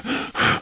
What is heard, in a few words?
sprint, player; cstrike